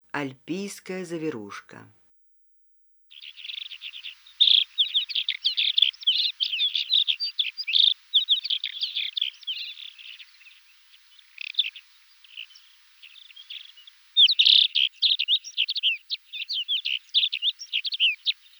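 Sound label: alpine accentor voice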